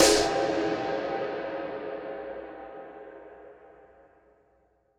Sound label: Snare Shard; ZeroGravity Drum Shards